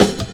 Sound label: Snare, Percussion Hits, Snares